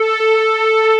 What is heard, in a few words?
beep; autoware